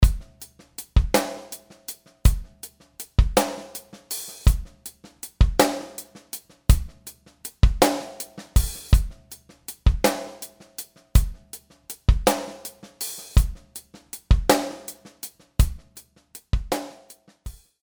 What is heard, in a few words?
Natural Drum Kit; Apple GarageBand 2; Apple Loops